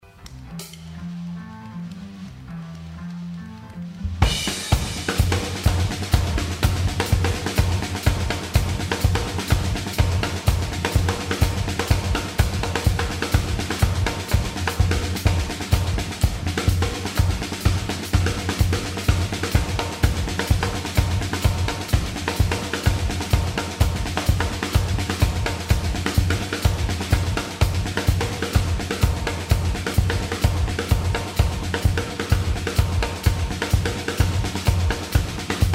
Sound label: Drum outtakes